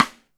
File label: AMBI STICK, SNARES; Back In Time Records - Big Bang